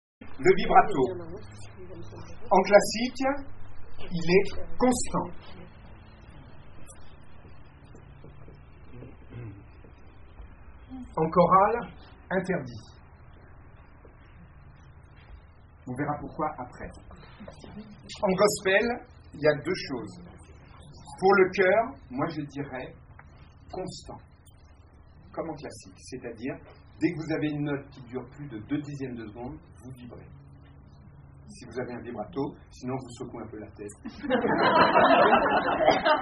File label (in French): Vibrato, TECHNIQUE VOCALE; CONTROLE